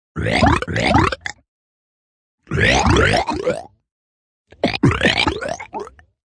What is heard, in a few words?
Burps Ripple, Burps, pitch bending, FX from human voice; Tech (Sound Effects:Science Fiction)